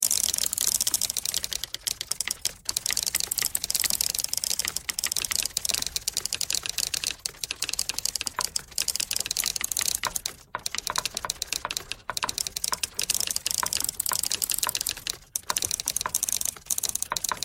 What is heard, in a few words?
typewriter thread all